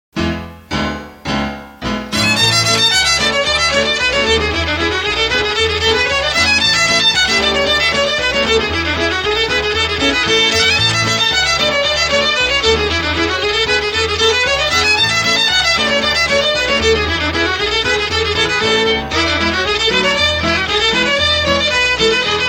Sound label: instrumental